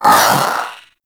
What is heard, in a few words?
Zanuka Companion Vocal Bark; Pets; Warframe